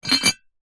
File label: household teapot ceramic lid place on; zapsplat